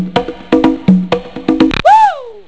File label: kongas